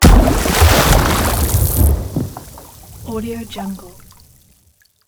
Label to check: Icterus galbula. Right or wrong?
Wrong